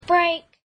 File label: break